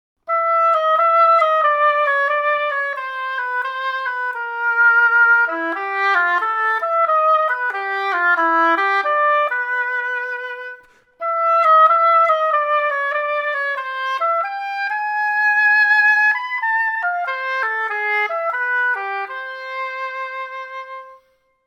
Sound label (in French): hautbois